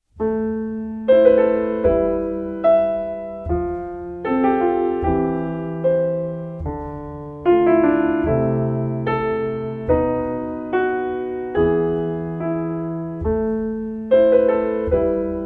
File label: piano